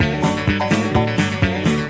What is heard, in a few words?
pickin good time